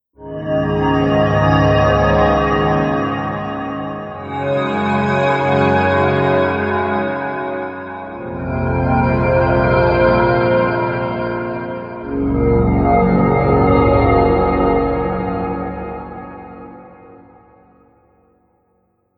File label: Dark Planet pad